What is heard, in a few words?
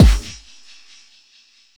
combidrums, techno; single-drums